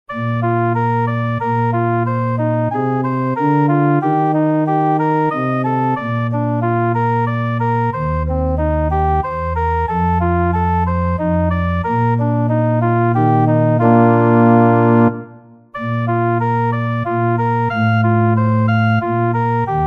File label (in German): Zum Sanctus (Tuba Solo + Orgel-Playback)